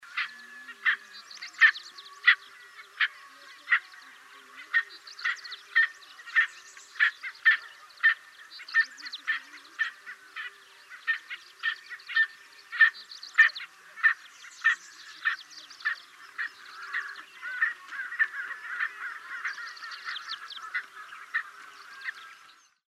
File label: Erckel's spurfowl